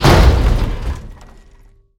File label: Veh Hit, Damage; DCS